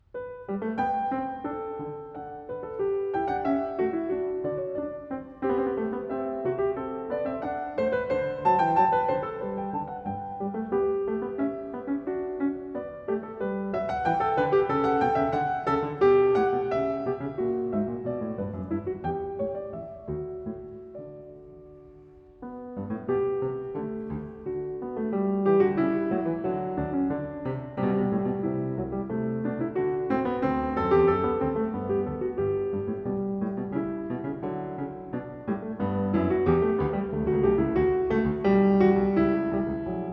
Goldberg Variations Var. 18. Canone alla Sesta a 1 Clav; Bach; BWV 988; Live at Carnegie Hall, New York, 2025